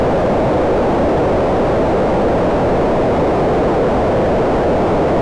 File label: filtered noise